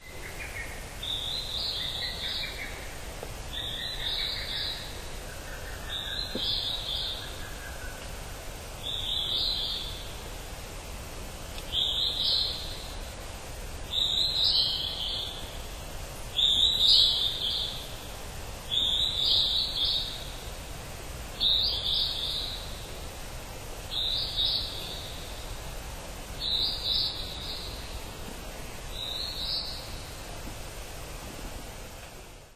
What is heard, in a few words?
hawk cuckoo